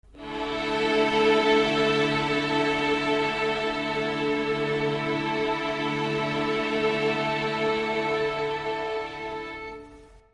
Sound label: realstrings